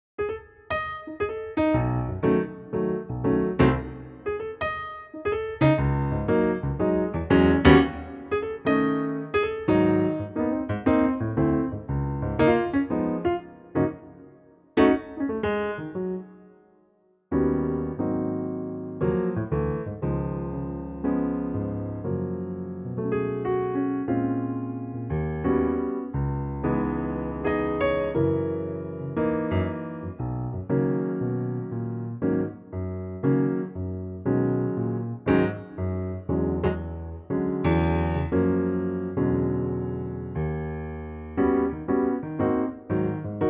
Gb piano

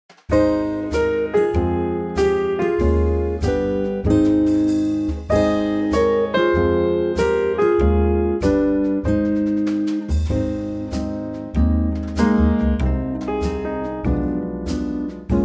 Ab, male

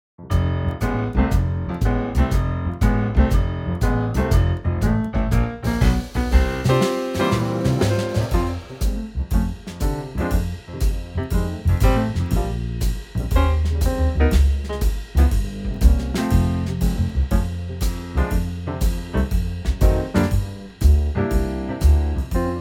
Trio; F; key - F - vocal range - C to Eb

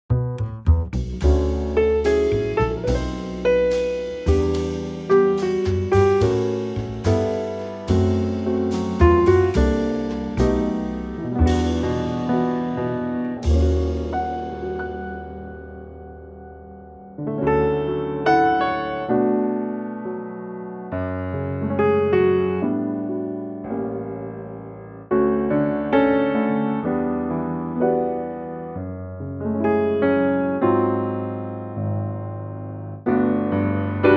Trio D; key - D - vocal range - D to F# (suit lower female ranges)

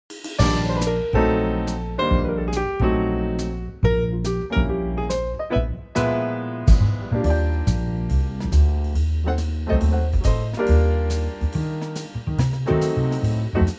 trio Eb; key - Eb - vocal range - D to Eb

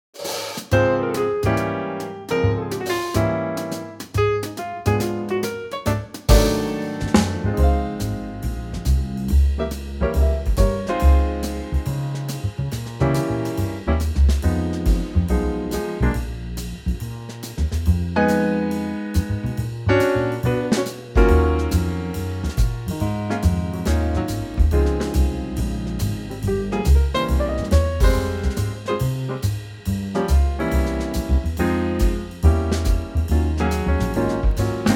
Trio; Db; key - Db - vocal range - C to Db

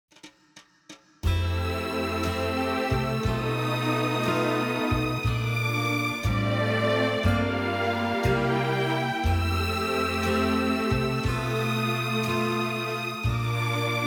F to G orchestral

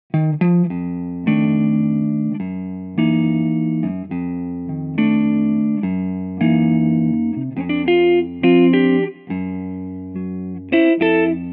F guitar

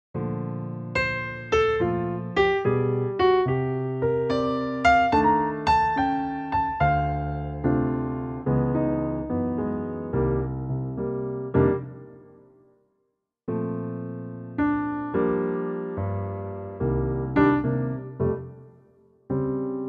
Bb piano; key - Bb - vocal range - Bb to Eb; Very lovely piano only arrangement of the classic American standard

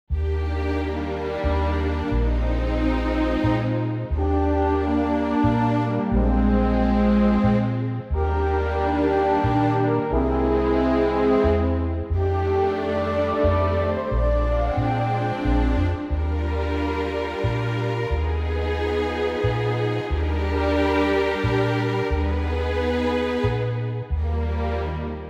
orch; Eb orchestral; key - Eb - vocal range - Bb to D

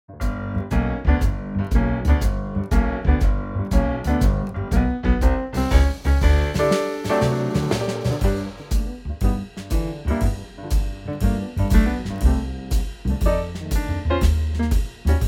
Trio G, male; key - G - vocal range - D to F; 40's song; Trio arrangement

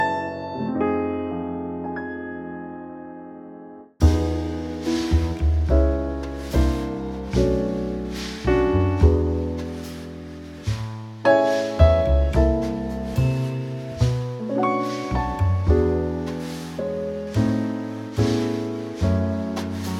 Trio E

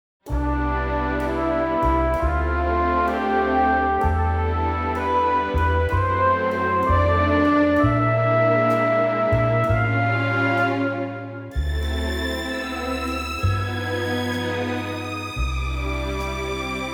orch C; key - C - vocal range - D to E

